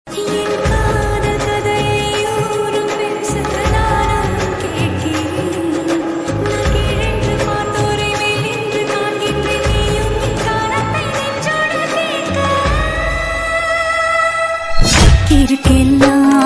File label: slowed ringtone